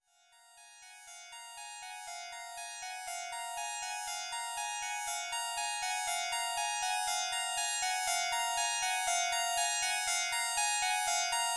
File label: Organ